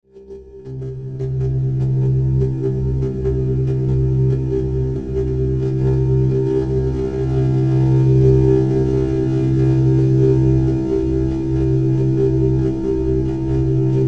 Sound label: DRONE STATIC, Drone Static Buzz Pulse Wash; Blastwave